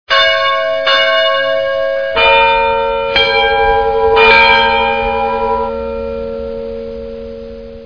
kapelle glocke

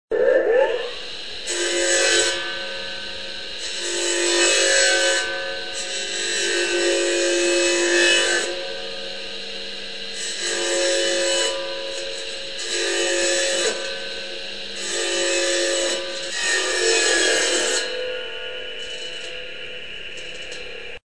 Kreissaege

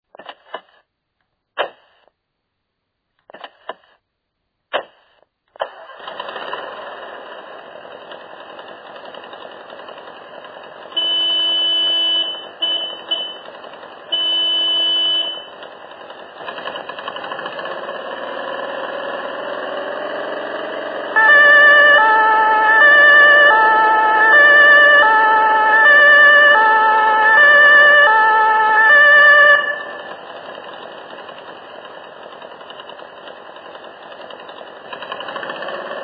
martinshorn

